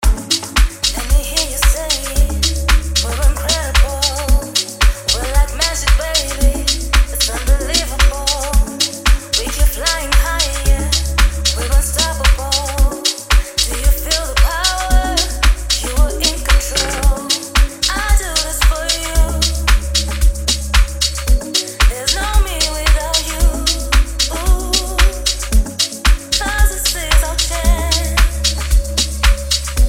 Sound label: Vocal Mix